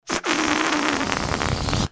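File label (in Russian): неприличный звук когда шарик сдувается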